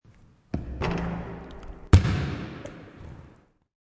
Basketball Miss Shot, Missed shot against rim, bounce, and catch.; Sports